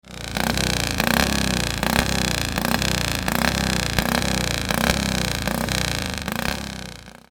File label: Cooper 500 Mk 10 JAP